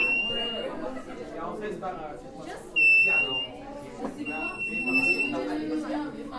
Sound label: gatorade bottle sound